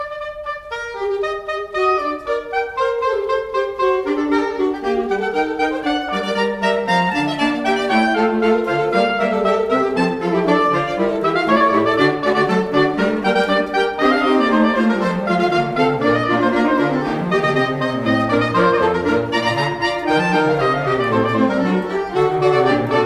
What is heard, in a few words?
sax